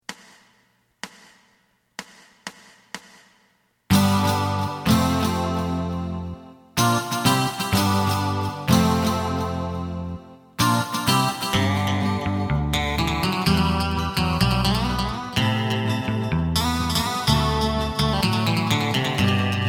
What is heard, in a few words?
drum